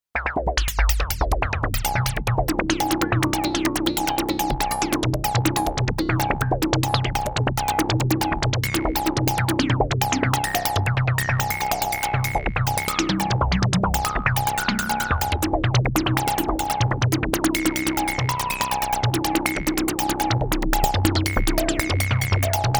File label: FX Arp